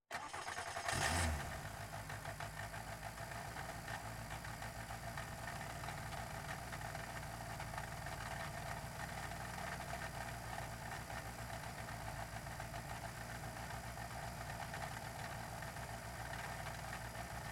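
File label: Skoda Fabia R5, onboard, IDLE, Various, ENGINE, Rally Car; WRC2 2020; Left; DPA4061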